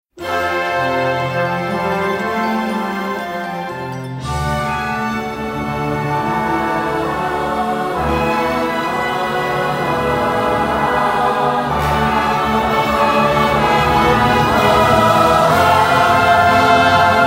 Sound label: vocals